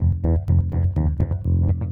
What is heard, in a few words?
Bb 120 Funk Rock